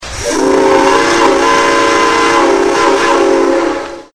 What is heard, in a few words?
Train Horn Whistle Sounds Car Horn